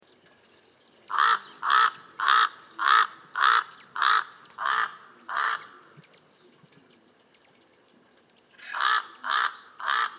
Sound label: Northern Raven Corvus corax sinuatus; birds-that-eat-suet